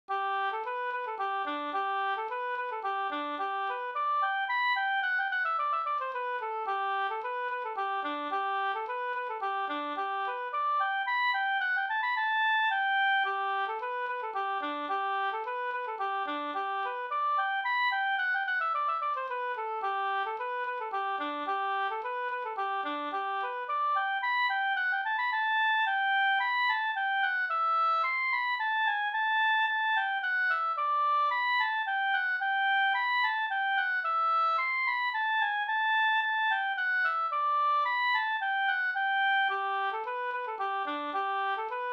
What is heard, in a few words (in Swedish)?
slangpolska; Slängpolska, "Allmän", ur "Traditioner af Swenska Folk-Dansar", häfte 2, tryckt 1814, nr 26